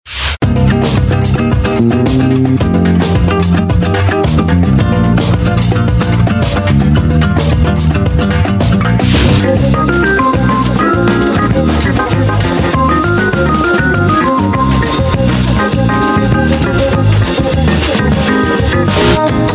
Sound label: Bossa Nova Mix; cafe